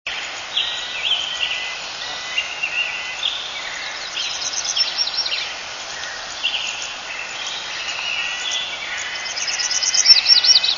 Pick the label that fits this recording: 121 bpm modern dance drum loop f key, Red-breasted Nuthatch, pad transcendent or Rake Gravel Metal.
Red-breasted Nuthatch